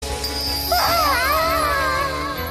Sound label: waaah